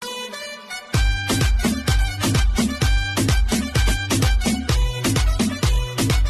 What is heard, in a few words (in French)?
victoire court trompette; gentok fr